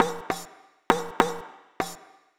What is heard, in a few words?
Riq, Acoustic Beats; Sonic Foundry (Sony Creative Software); Myths of Technology